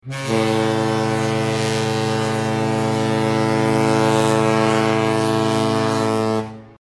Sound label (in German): Costa neoRiviera Schiffshorn Klingelton; kreuzfahrtschiff